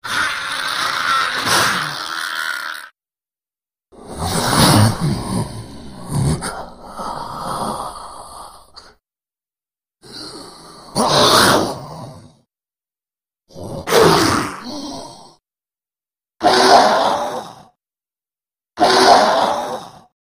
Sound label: Attack Vocals Comp S, monsters